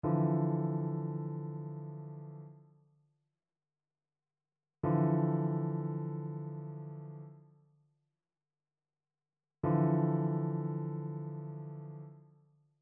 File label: trichord; trichord identification